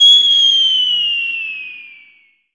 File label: whoosh; KevinBlast; marble